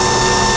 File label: hydro loop